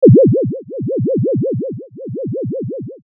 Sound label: cyber boom